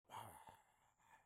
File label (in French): tabouret plateau qui tourne; Mobilier